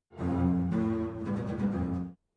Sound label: Terrible Counterpoint